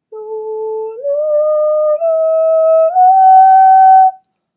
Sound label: Echo Pattern